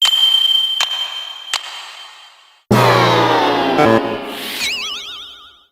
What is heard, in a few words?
lead up lose